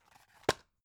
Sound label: drug package drop deep hard; berufe; arzt